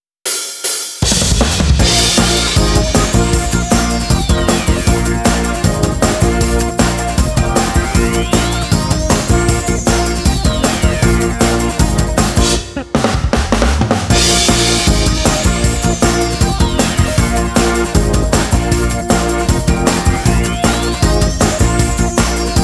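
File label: background music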